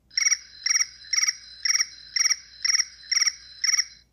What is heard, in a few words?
crickets